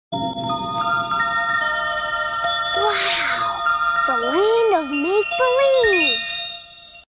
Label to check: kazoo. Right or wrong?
Right